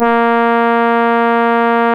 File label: A#, Synclav FM, BRASS; Big Fish Audio